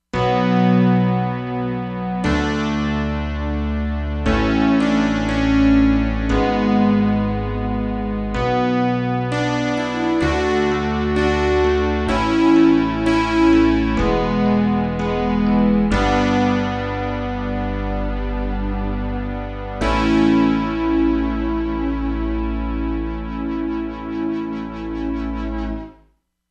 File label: Pad 2, Korg MSC-07S Synth